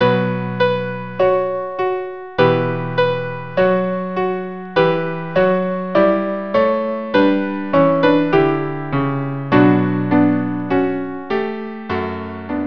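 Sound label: sakura violin piano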